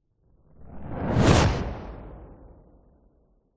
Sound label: cinematic whoosh fire ball fizz; sound design; zapsplat